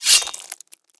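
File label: knife hit, weapons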